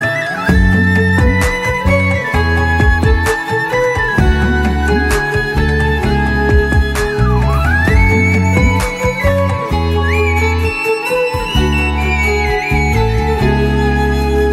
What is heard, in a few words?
Mother Song Bgm; Valimai